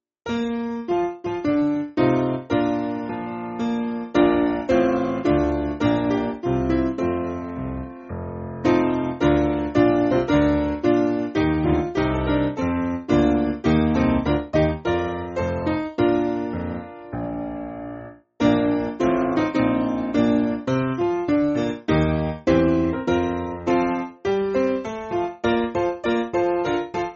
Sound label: Piano, church music